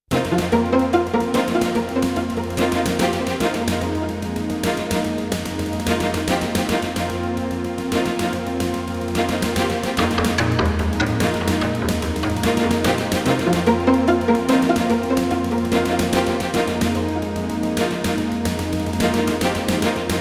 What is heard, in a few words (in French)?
Flash Info Version longue; Jingles medley